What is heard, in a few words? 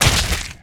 Blood Splat Gib; SFX Kit; Foley - Misc